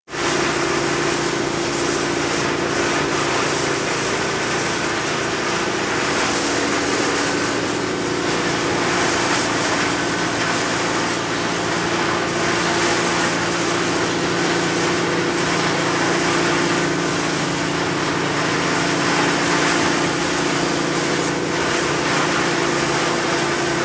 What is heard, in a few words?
Beeps; 헐리웃특수효과음; 신호-경적